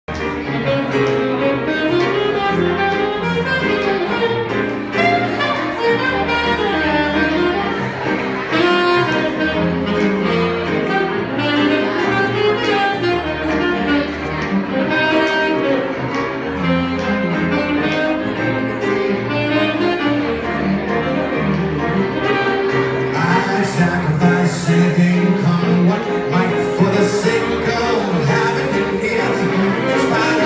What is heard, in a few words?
jazz